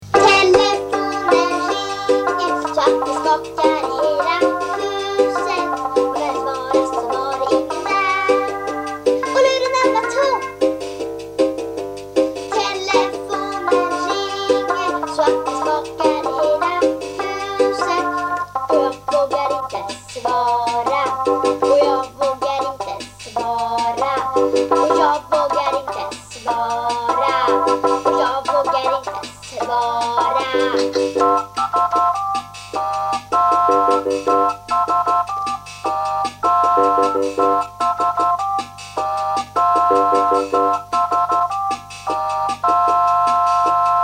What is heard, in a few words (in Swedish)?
telefonen ringer